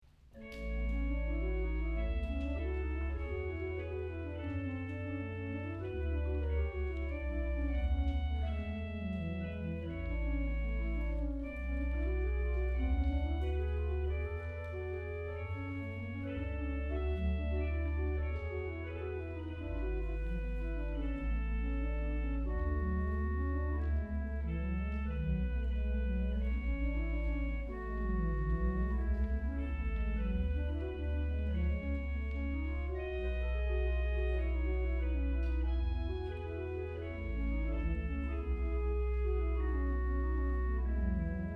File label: Air With Variations; Stirling